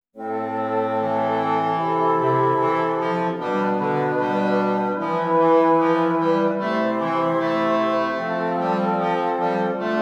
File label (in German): Stimmiger Contrapunkt; 5 Gattung; Ronge